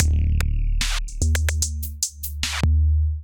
Kit groove 74 bpm; MIDI Clips; TraPERATOR; Ableton; Drum Essentials

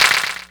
Clapbox Pitch; Ableton Foundation FreePacks

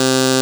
svox, Digital Synths; Ableton Foundation FreePacks

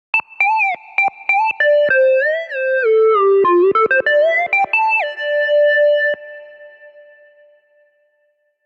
Sine D Minor 88bpm; MIDI Clips; Melodic; Ableton; Factory Packs; Chop and Swing

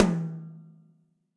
Tom High Direct Natural, Drum Hits; Standard Hits; Ableton; Factory Packs; Session Drums Studio

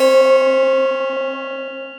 Chime Keys, Synth Keys; Instruments; Ableton; Synth Essentials; Tension

